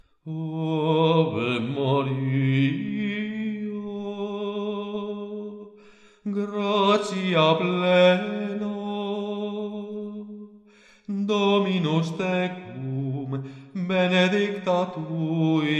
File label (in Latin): Ave Maria Guide Solo, gregoriano; Virgen Maria